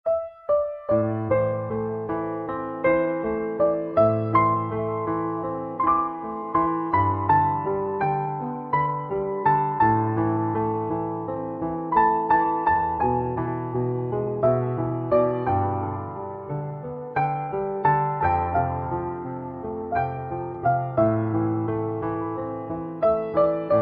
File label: Piano Silent